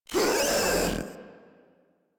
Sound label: Proto Infested Runner Pain; Warframe